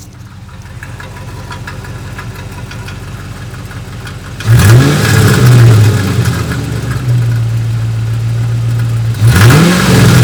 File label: mach exhaust; svt cobra mustang club